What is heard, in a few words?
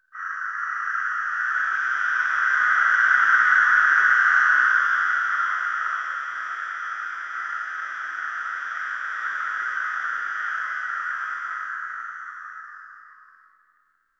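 texture of a mystical forest, crows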